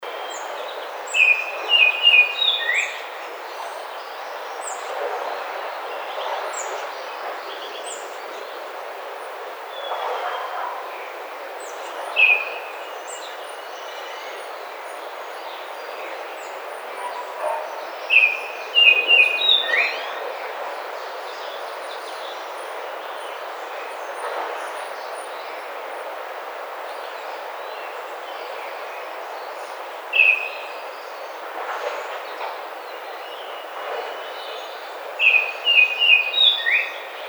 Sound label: Baltimore Oriole